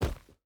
footstep boots forest jump land; Rust

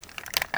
g3 reload lift

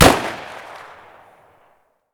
toz fire far; Escape from Tarkov

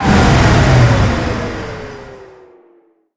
Bradley engine acc end; DCS

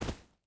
footstep barefoot stones jump land; Rust

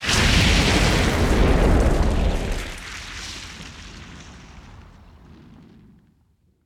explosion material ground; War Thunder

